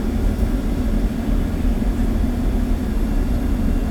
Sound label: store loop; firefight